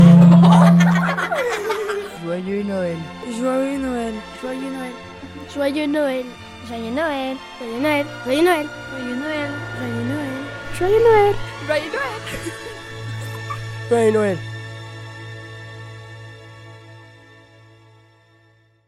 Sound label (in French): JOYEUX NOEL